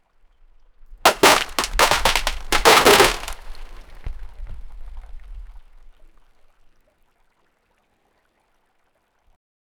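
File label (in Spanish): boya de una caña cayendo, fishing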